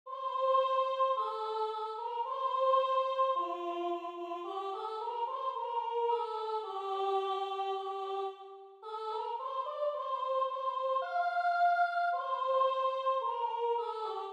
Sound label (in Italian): Cantate a Cristo Soprano; Base soprano; Cantate a Cristo (G. F. Handel)